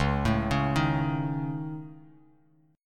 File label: C sharp Suspended 2nd Sharp 5th C sharp Bass Standard, chord; arpeggiated; 9,12,11,8